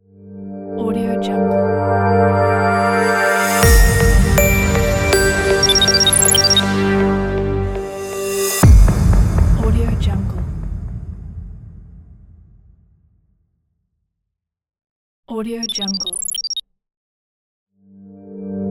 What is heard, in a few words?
opening title logo